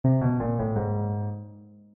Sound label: level failure